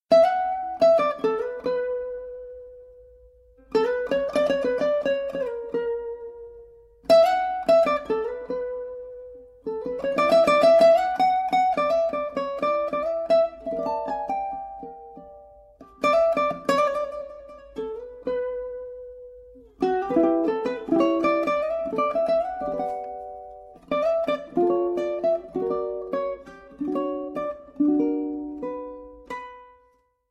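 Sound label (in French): ukulélé, cordes pincées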